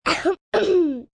Cough Kid Clear, Cough, child, girl, clearing throat (human voice)